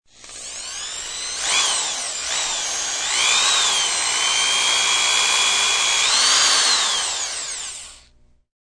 Hand Drill, Electric hand drill running and reving.; Power Tools